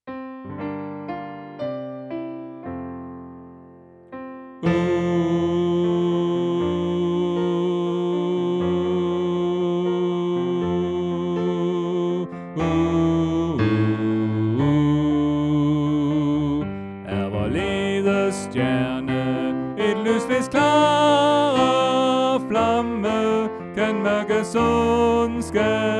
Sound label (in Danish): Bas